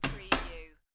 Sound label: Playing Cards Tap Deck Of Cards On Wood Table